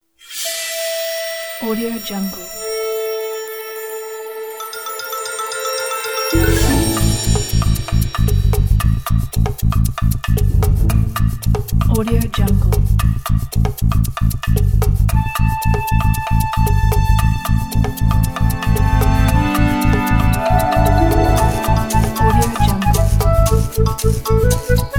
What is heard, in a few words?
comedy theme